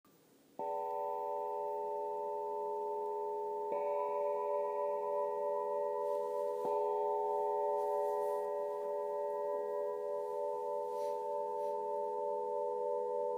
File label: Pyra 305mm; Pyramids; etoilescristallines